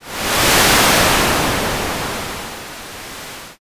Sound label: wind